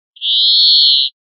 quejas giros; verderon

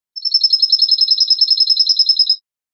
cascabel

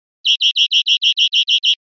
chones corridos enrabietados, verderon

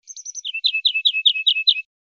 primaveras, verderon

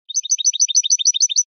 bibleos acascabelado; campero

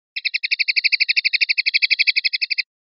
rep sonajas

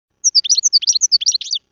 floreo tipoli tipoli; campero

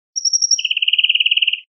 chinchines, verderon

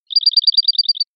campanilleo

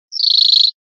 chorros cortos amillerados, verderon

